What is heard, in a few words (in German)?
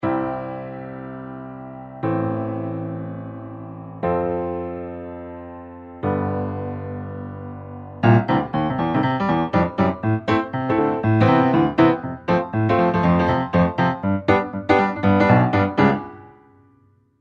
Gospel Klavier; Toontrack EZKeys